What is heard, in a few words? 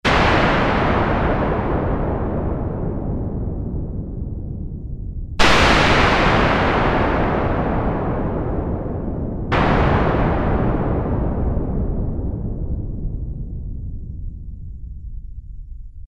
Thunder, Roland Juno-106; Factory Preset